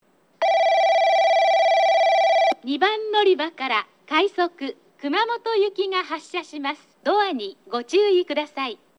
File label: omuta SL hitoyoshi kumamoto